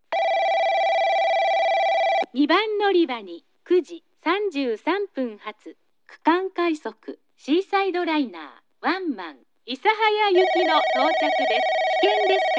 regional rapid Isahaya

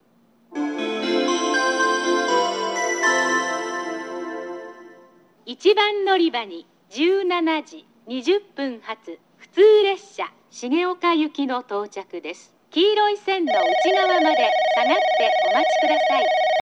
oita local shigeoka; 1番のりば接近放送（普通 重岡行き）; 大分

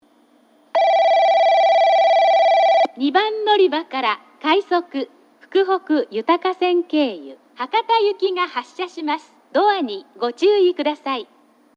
orio rapid hakata; 2番のりば発車放送（福北ゆたか線経由・快速 博多行き）; 折尾

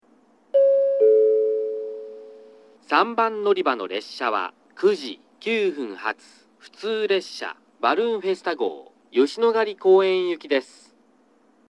balloon

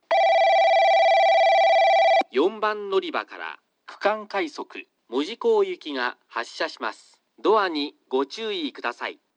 kurume regional rapid mojiko; 4番のりば発車放送（区間快速 門司港行き）

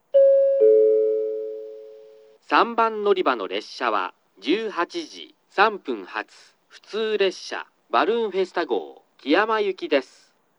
balloon

